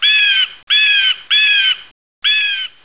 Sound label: eagle; de dustier